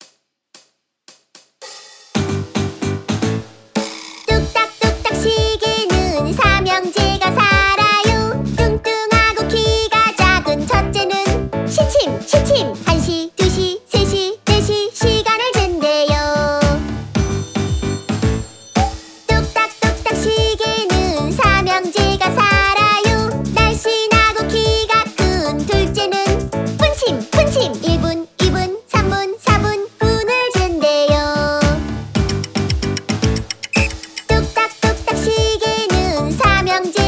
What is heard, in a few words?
Kids Melody, Clock Song